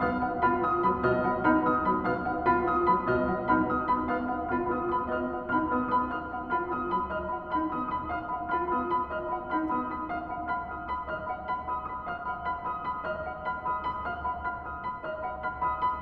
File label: Canto Ostinato, Minimal Piano